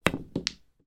sport miniture snooker pool ball hit with cue strike other ball